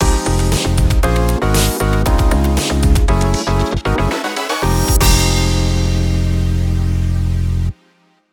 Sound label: Start Jingle; christmas